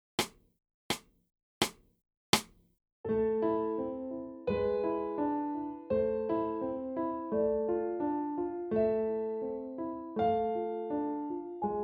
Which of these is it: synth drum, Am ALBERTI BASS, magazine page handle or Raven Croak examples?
Am ALBERTI BASS